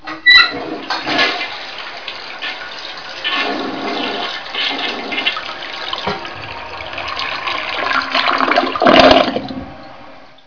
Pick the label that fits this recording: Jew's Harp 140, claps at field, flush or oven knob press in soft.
flush